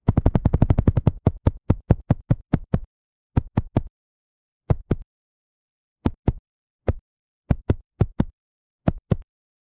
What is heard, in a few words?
football hitting the goal